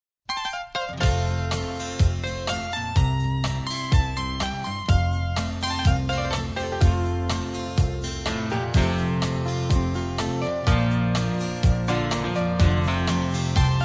harmony vocal

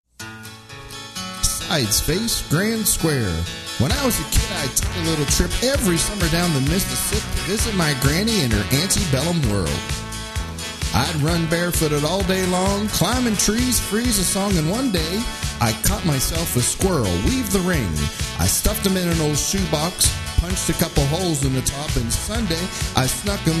vocal

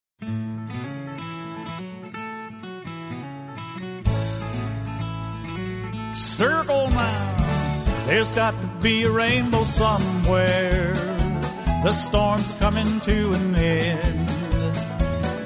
vocal, square dance

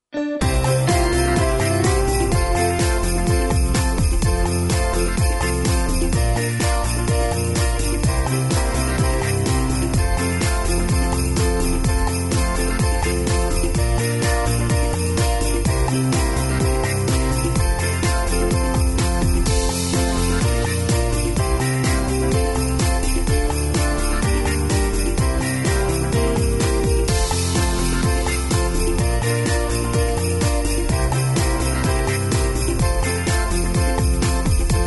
rhythm track with bells

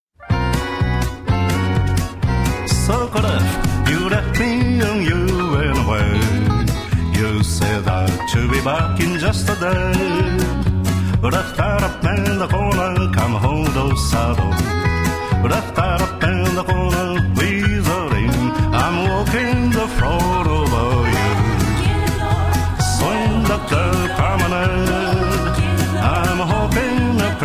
vocal, square dance

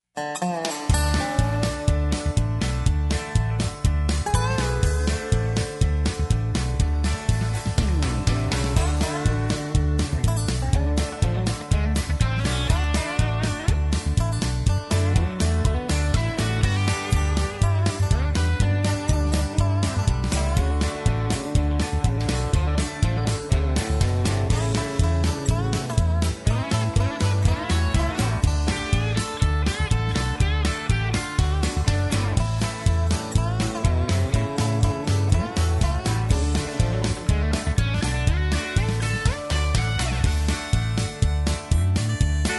hoedown extended

